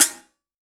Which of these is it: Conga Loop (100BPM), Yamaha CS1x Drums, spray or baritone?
Yamaha CS1x Drums